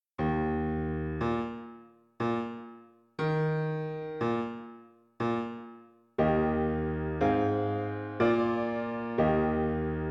Piano

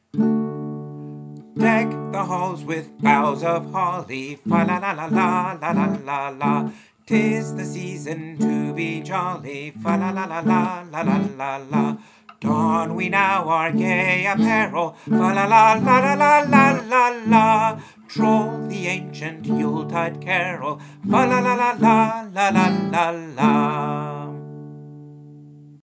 Deck Halls In D

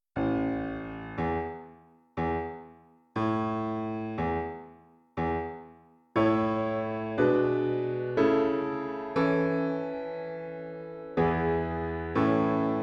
Piano

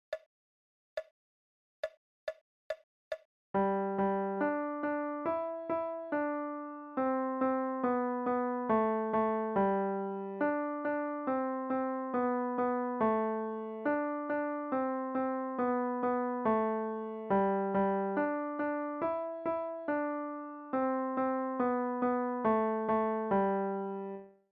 Twinkle

